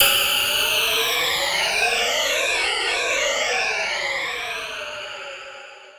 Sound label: Overhanging Downlifter, Dubstep; One-Shots; Effects; Ghosthack; Dubstep Sounds 2025